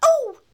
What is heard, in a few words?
ouch; rapid ragdoll